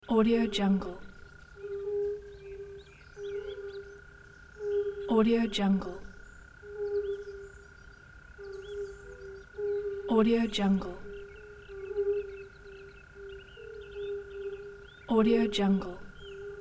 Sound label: lakeside night birds wildlife